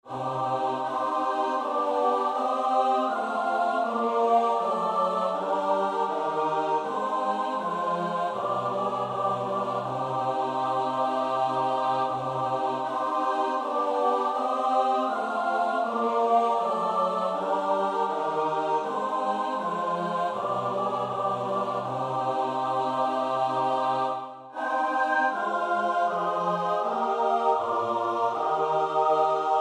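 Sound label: soprano alto tenor bass; Bach (Johann Sebastiaan); BWV 073; Das ist des Vaters Wille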